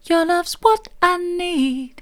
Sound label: soft, vocal